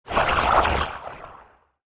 Alien Tentacles Attack; Alien Sound Effects; science fiction sound effect

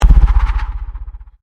Convolution Pulse

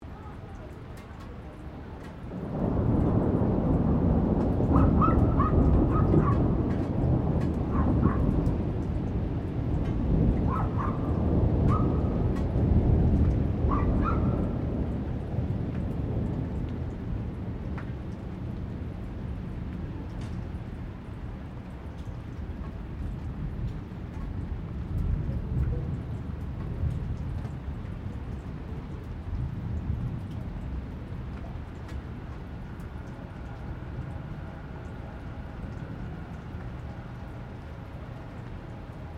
THUNDER DISTANT DOG BARKING

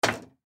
China Cabinet Door Close Hard; Miscellaneous Doors; Household

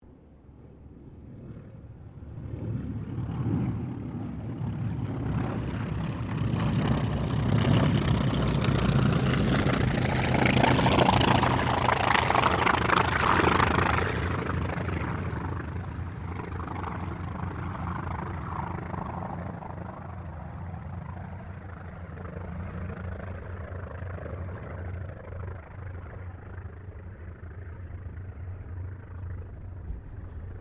AIRCRAFT TROJAN SQUADRON 4 PASS; Mixed aircraft squadrons; Airplanes; Sound Effects:Vehicles

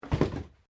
Cardboard box drop; Material components for constructing more complex sounds; SoundEffects Foundation2

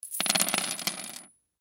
Nuts Washers Pile, Nuts Washers Pile Drop Wood; Hardware; INDUSTRY; Blastwave

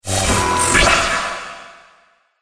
Starship Laser Rocket Launch; Spacecraft

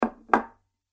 Dessert Bowl Slowly Placed On Table; Dishware Sound Effects; Household; Andrew Fleming

